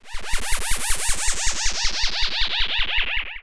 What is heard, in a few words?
Sfx, Trance; Zero-G Trance Inducer; General Sfx